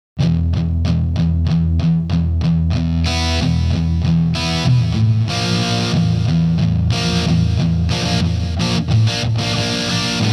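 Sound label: intro EHX Green Russian BMP, guitar; Reeves Eclipse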